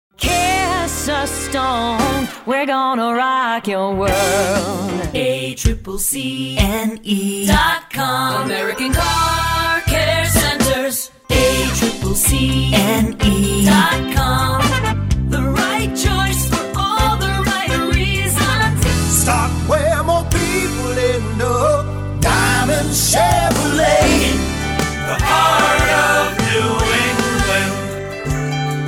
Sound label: jingle